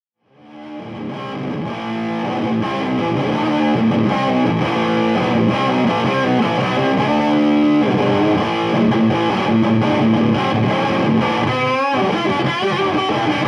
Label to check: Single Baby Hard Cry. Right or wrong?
Wrong